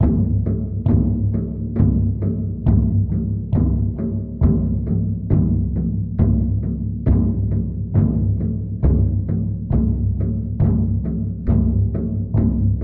68 darkness native american, drum loops and breaks; 000 to 080 bpm